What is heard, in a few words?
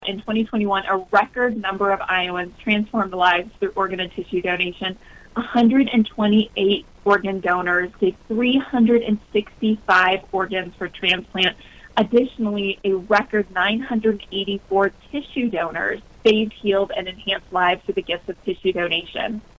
organ